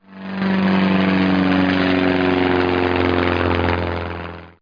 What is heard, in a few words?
prop by; Microsoft Graphics Studio Greetings; Microsoft 1998